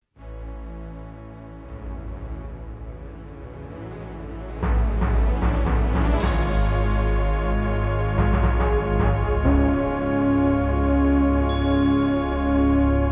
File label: Fanfare; Sierra Fanfare; Castle Of Dr. Brain; Ken Allen, Chris Brayman, and Rob Atesalp